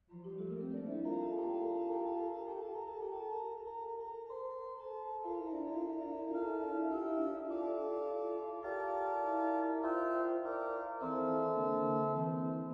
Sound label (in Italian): capriccio del cardellino, organist; Carlotta Ferrari